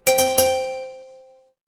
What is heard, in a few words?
feedback achievement